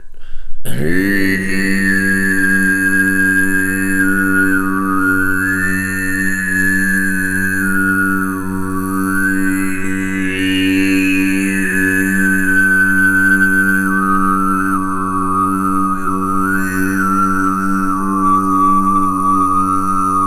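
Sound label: Mouth Chant Reverse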